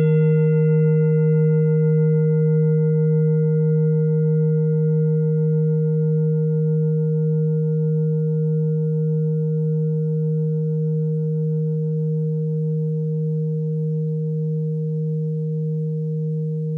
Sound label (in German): klangschale ladakh